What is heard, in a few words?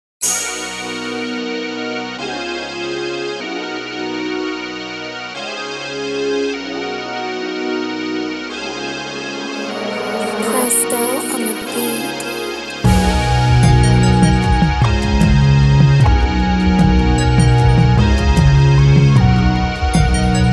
Instrumental Rap Type Beat